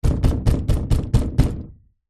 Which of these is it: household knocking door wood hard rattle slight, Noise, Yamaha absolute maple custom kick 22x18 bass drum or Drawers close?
household knocking door wood hard rattle slight